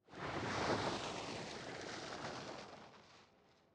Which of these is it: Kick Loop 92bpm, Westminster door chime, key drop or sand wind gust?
sand wind gust